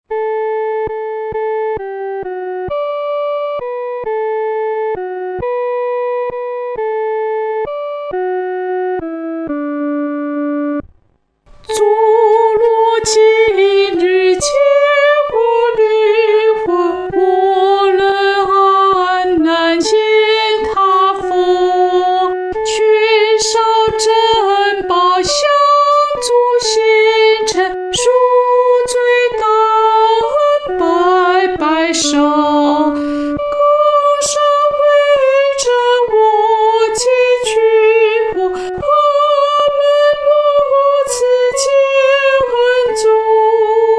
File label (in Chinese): 独唱 第一声